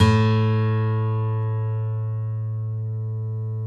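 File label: GTR A1 AC; Synclavier Sampler Library; KEYS AND GUITARS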